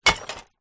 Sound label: impact misc trash glass hard